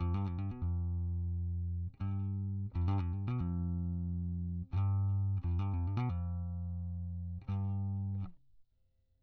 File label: bass